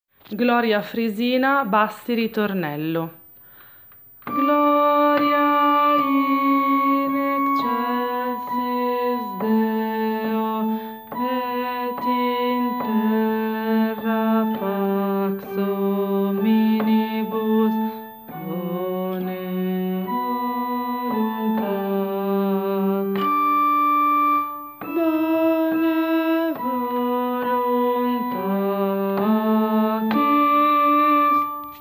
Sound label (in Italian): ritornello bassi